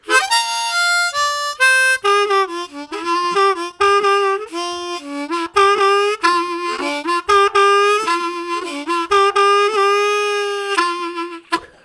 Urgent, Harmonica; Zero G

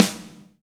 Reg. Snare; Snares

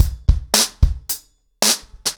110BPM, ACOUSTIC DRUMS; The Roots Drumloops

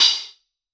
Solid Quartz, Hats; Airwindows

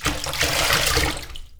WATER, household; AKAI Anime Sound FX

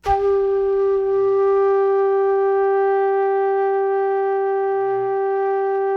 FLUTE FF G4; Greytsounds; Philip Wolfe David Crozier's Saxophones

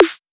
snare pitched; serum

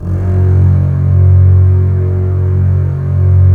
STR CBS, Roland LA Composer; Roland - LA Composer vol. 4

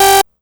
Synth Hit G

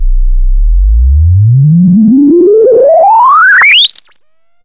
HI SWEEP UP; Zero-G Ambient 1; BOUNCESWEEPS

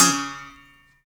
Berimbau; Roland LCDP11 Africa VOL-1

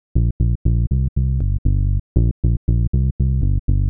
Smooth Bass (120BPM), retro house

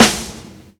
SNARE, Ragga & Dub Reggae; USB Soundscan vol.27; AKAI; SNARES KT

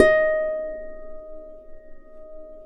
Eb4 HARP; USB Soundscan vol.62; AKAI

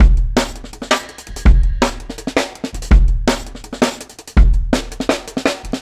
Marching Kit 4 Bar, Drum n Bass Beats; KJ Sawka; Drum n Bass & Beyond

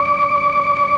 OPTIGAN D4; Sonic Reality; Vintage Timetraveler

